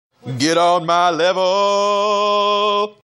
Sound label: Classroom sound